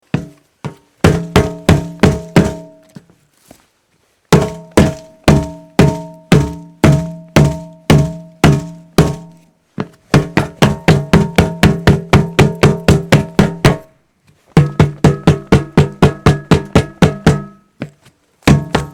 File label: hollow hitting